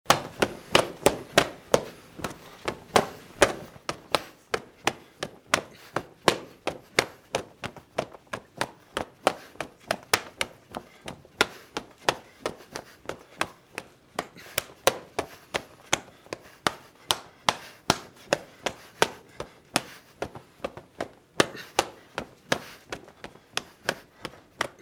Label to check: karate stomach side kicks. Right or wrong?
Right